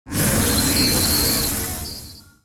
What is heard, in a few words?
sorcery